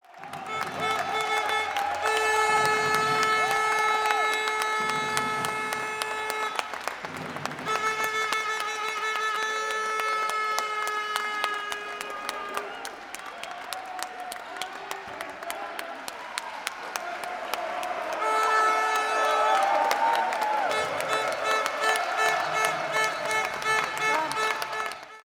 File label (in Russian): nba zhivoj svist